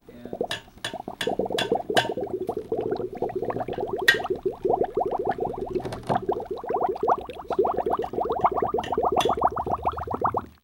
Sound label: bottles and bubbles, fieldrec